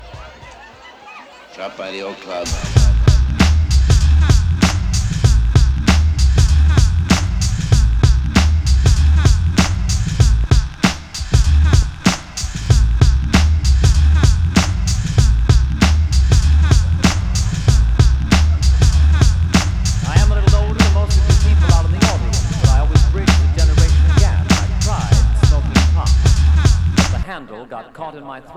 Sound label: breakbeat